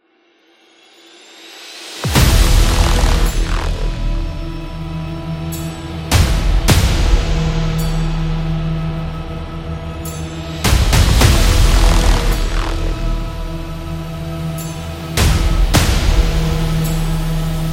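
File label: Combat, epic cinematic trailer music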